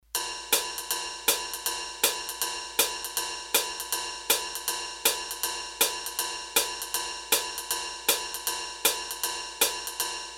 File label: Jazz, Roland D-10; Rhythm Demos